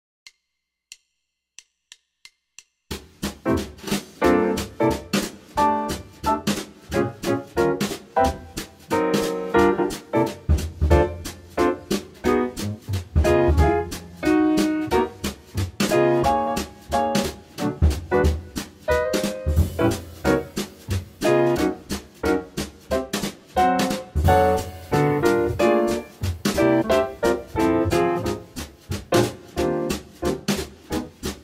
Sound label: audition for bass